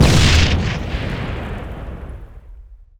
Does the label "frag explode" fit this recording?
Yes